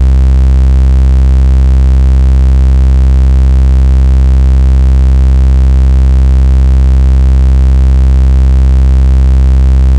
Bass SAW L, Yamaha DTXpress misc voices

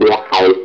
wahs; wahs for fic; E-MU Producer Series Vol. 8 – Platinum Phatt; MoPhattPerc&Kits

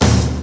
Information Menu Swish; Pitfall - The Mayan Adventure

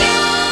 stabs; stabs & hits

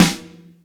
Snare, Drum Hits

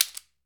AFRICAN, African Perc; E-MU Formula 4000 Series Vol. 4; Earth Tones

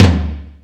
DRM G#, YAMAHA R5+RX; Best Service ProSamples vol.55 - Retro Sampler; AKAI

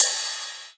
Rides, Cymbals

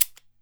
CABASA, Brazil Drum Perc; E-MU Producer Series Vol. 7 – Old World Instruments

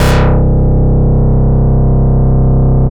Bass Note C

